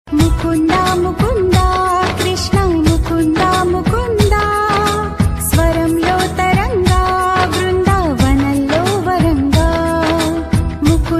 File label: telugu ringtone